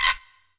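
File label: Scrape, Percussion Hits; Zero-G Jungle Warfare 1, 2 & 3; 90 s Sample CDs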